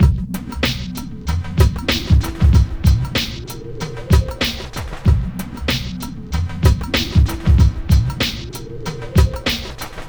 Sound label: Beat 095, Hip Hop